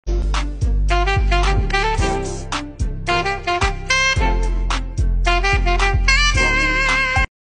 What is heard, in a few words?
Elevator music